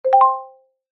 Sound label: mobile phone notification; zapsplat